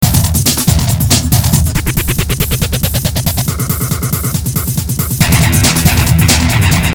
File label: depressing rock music